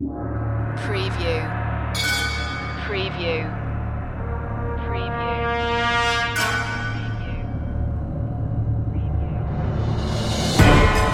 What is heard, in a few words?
no choir